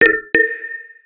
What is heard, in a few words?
bulb one, notifications; Nothing Phone (2)